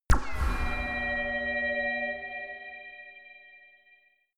ringing laser beam shot; soundpool

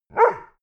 bark once, hunde; natur-tiere

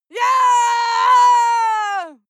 female lunatic scream attack long; menschen; schreie

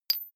medium metal impact soft

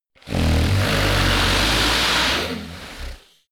monster snore heavy low; koerpergeraeusche; comedy-cartoon; soundpool

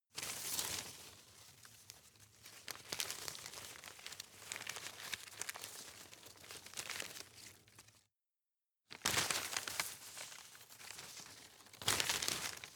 rustle medium long; berufe; foerster

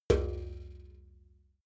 metal bucket hit soft; objekte; eimer

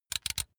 razor extend comb triple; maschinen; rasierer

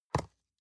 box small open soft; industrie wirtschaft; bueroarbeit

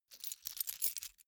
key ring large rattle soft; objekte; schluessel

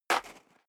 step high heels gravel light single stair down; schritte; Footsteps

